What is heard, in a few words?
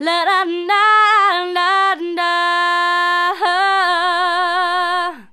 Female vocals and robo-vox